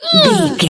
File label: voice female hurt pain